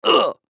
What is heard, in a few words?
Human Grunt; Grunt Raspy Reaction Element Male 25 Yrs; Grunts; Sound Effects:Voice